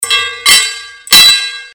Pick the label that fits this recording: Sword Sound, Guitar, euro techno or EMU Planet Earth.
Sword Sound